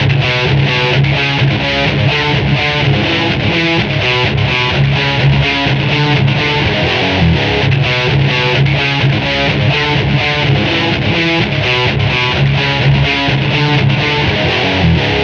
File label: Guitar, Rock